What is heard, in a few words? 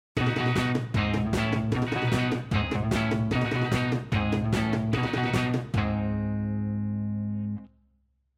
Drums Solo Inst Break